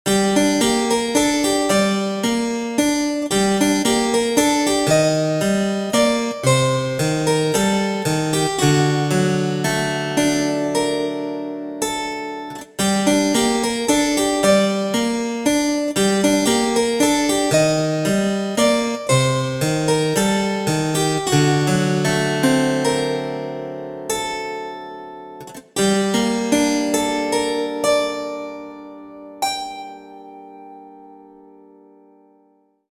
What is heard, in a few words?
jingle main theme; Haunted